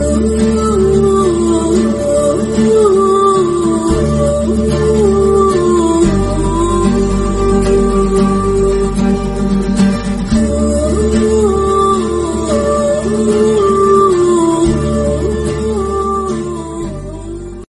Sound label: flute Humming bgm; orange movie; Telugu